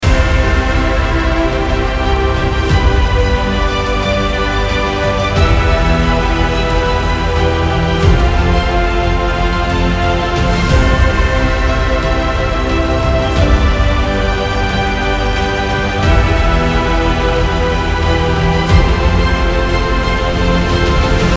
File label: cinematic loop